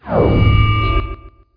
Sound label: anti matter bomb pre implosion